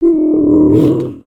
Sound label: Attack; Skullkin; creatures organic